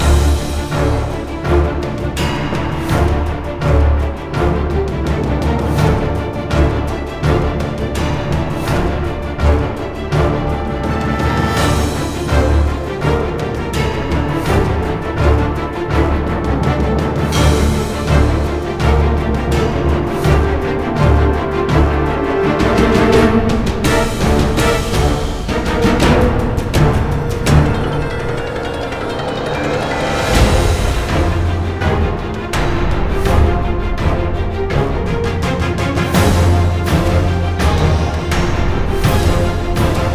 Boss Spiritual; Dungeon Hunter 4; android ios

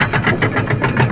Drawbridge (Loop); Splash Blast Panic; Sbp Duel Of Scales

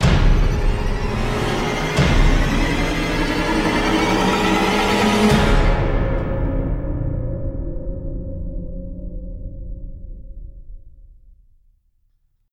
Falls Off Plane Stinger

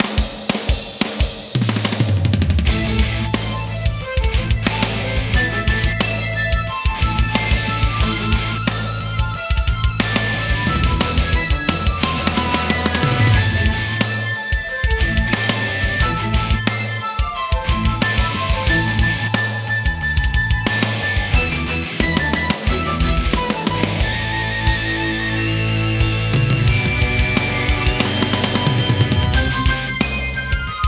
Combat Theme TENSION With Solos

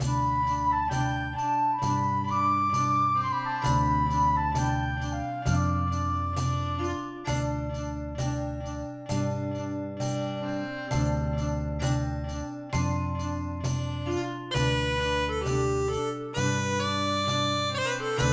Snow Upbeat; golf story

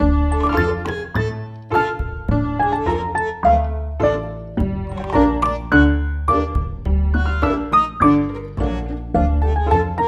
Living room theme; Toy Odyssey The Lost and Found

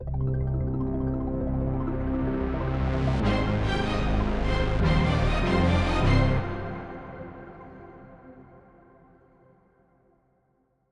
Radio Jingle; Atomic Heart; radio and tango songs

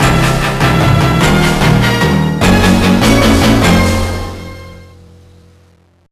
Beat Final Boss; X-Men: The Official Game; GBA

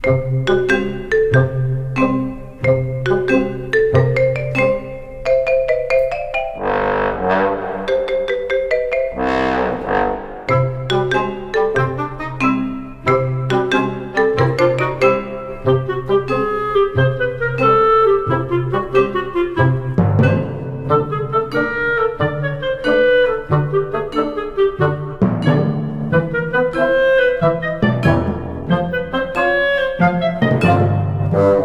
Comic Theme Loop; Gran Saga

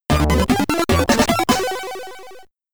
Get Ready! (SID Stereo); Alien 3; Commodore 64

